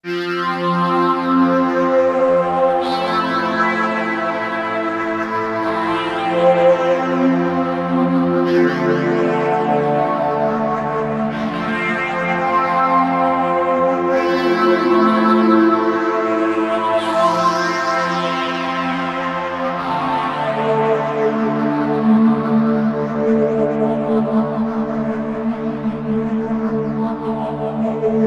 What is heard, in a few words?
Morning Time, Pads; KJ Sawka; Drum n Bass & Beyond